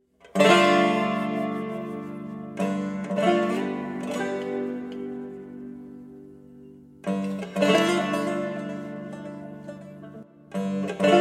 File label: Setar Chord